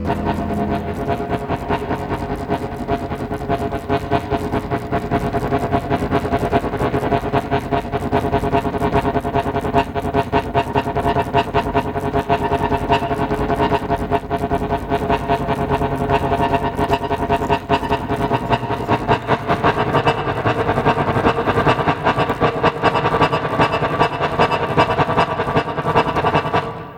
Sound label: Sax